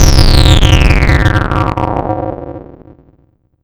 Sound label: player death